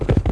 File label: Horse Run; Civ4 warlords